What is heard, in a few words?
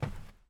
step wood